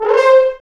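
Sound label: french horns rips; Roland L-CDX-03 Disk 2; F.Horns FX+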